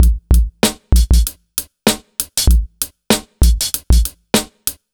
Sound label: 97 Beat, Underground Hip Hop